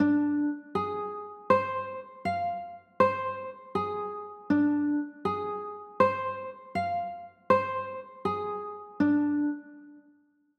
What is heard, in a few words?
b flat 9, chords